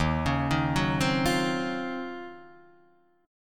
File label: Eb Minor 13th Eb down Guitar Open D